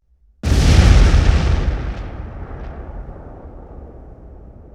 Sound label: Explosion, 5.1 Surround